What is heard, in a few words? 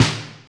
round acoustic snare sample a sharp key, steel snare drum tuned to the A# note